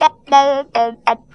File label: Funky Vocals; USB Soundscan vol.18; AKAI